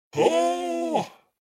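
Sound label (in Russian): протяжное оооо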